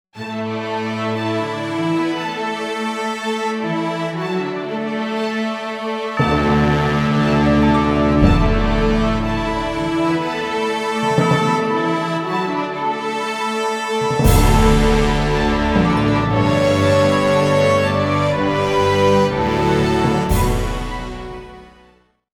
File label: Big Orchestral, Music; Fash Video Music Demo